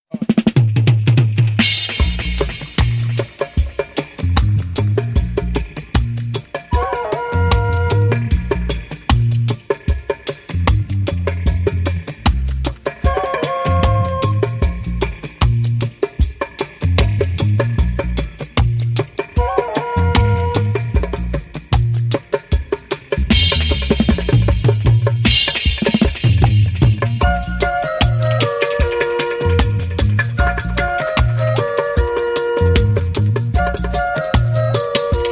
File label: Psalm 16 (Flute cut); Reggae